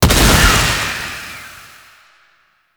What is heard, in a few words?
Boss Clone Death; Enemies; Abilities; Warframe; Oraxia